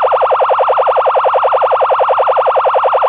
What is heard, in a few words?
phaser, siren; dash mount siren